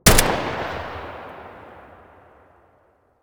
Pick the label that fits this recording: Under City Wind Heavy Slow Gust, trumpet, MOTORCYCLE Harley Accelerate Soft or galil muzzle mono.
galil muzzle mono